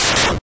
cut, attack sound; カタナ１

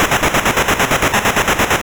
shoot loop, attack sound; カタナ１

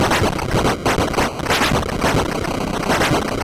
collapse, attack sound; カタナ１

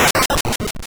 overthrow, attack sound; カタナ１

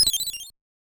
Other attacks, 8bit; カタナ１

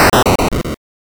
overthrow, attack sound; カタナ１

